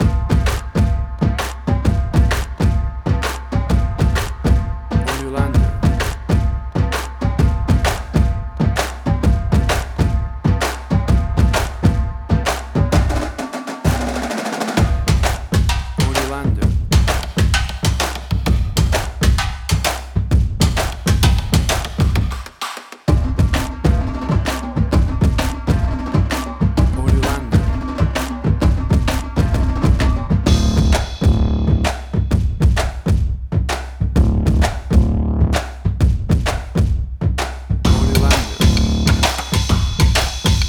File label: Drumcore; Level 2